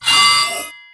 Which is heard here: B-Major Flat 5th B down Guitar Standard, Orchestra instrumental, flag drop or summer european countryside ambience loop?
flag drop